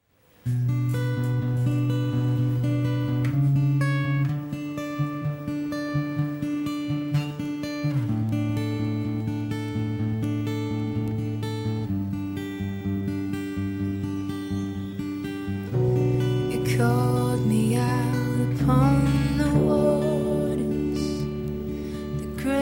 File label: acoustic version